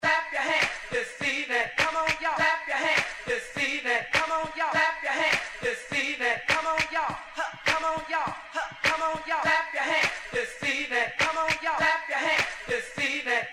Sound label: clap yours hands